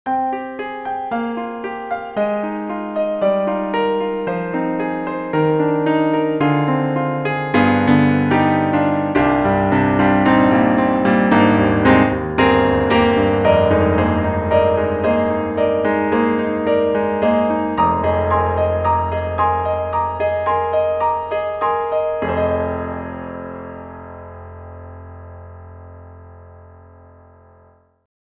Roland Juno-Gi Monaural Piano

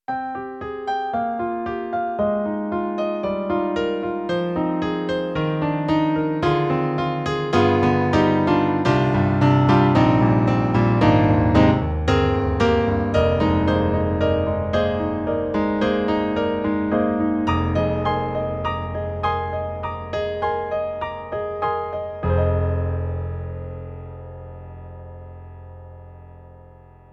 Warm Piano; Roland; SonicCell

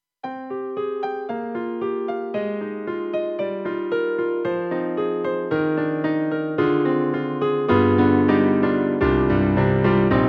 Dark Warm Grand; M3 Version 1 Wave; KORG M3 XPanded

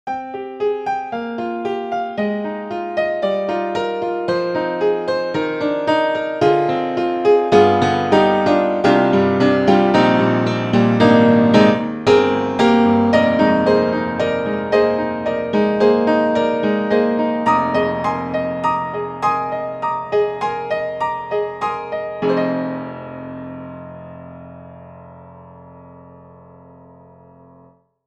Upright Pianos Modern Upright Modern Rocker; Synthogy; Ivory II

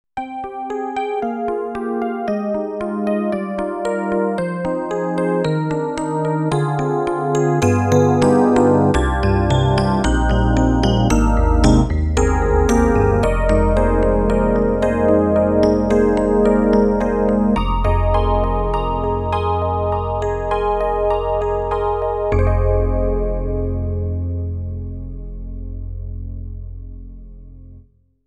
EDIROL SD-90 Classical E.Piano EP; E.Piano 2; EP Legend

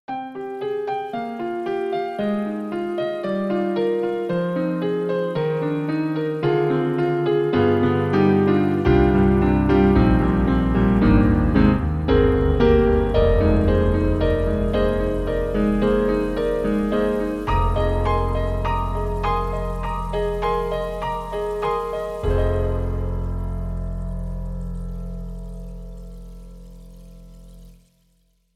KORG KRONOS 2 Water Piano

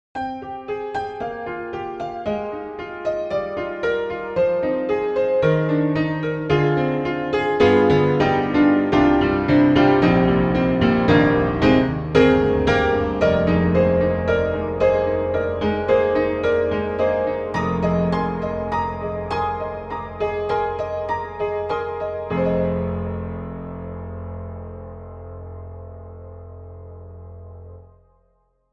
Modern Upright Modern Romance; Upright Pianos; Synthogy; Ivory II

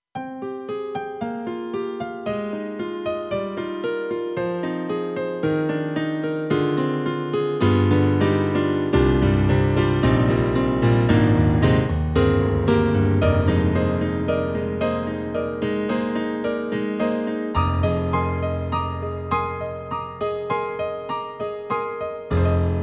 KORG M3 XPanded ST MIDI Grand w Pad2; M3 Version 1 Wave

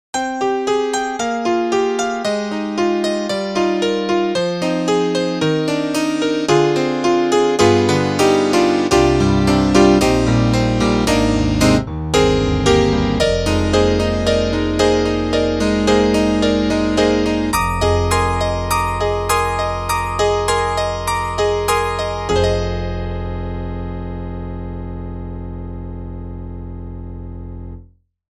Roland JD-990 Acoustic Piano

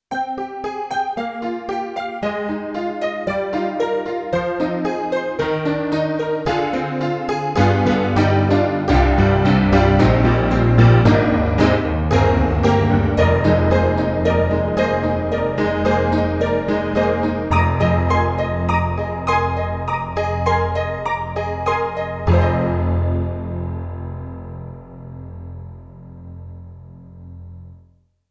Acoustic Piano Player Pno; Alesis NanoPiano

